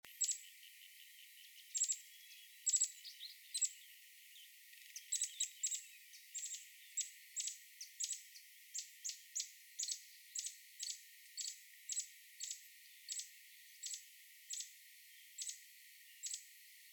Chestnut vented Nuthatch Sitta nagaensis, chestnut vented nuthatch call